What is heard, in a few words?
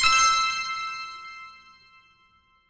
count up end; druids dream